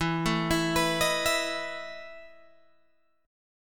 E Major 7th Suspended 4th, Guitar, Open G

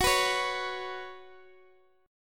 Gb Suspended 4th Gb, down

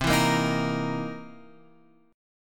C 7th Sharp 9th C Guitar Open F; strummed; JGuitar

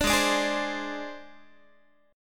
Ab Major Flat 5th Ab Guitar; AbMb5 strummed

